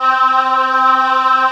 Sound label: C 605 CHOR, Yamaha C605; TECHNO-BWARE